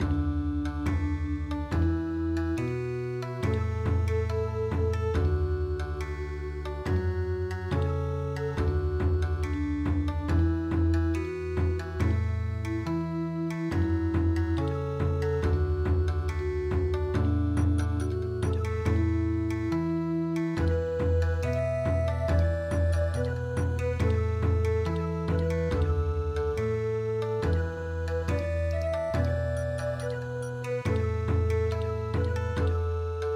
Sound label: Bon Odori, midi